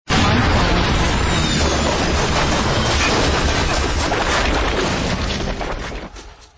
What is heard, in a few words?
helicopter crash